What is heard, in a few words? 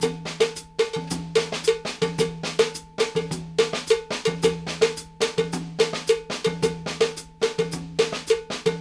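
cowbell + tom, right; Sampleheads; New York City Drumworks VOL-1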